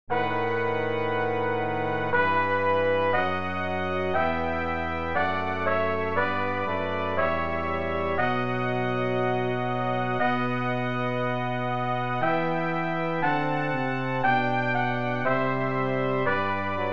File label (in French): choral pour trompettes trombone tuba; benoist regis bwv38